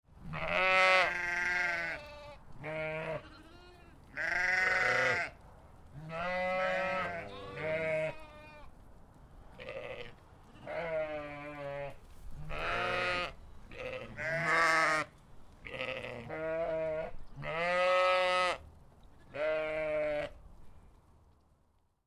Sheep Farm, Several sheep, adult and young lambs, bleating; on a farm; Distant highway traffic and a few birds in backgroun; Sheep Sound Effects